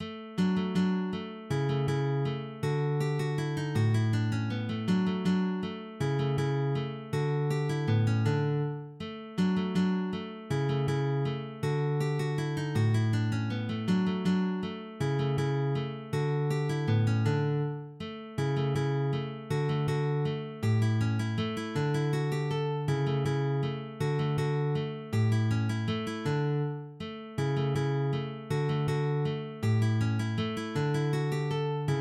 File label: walze, guitar; Ferdinando Carulli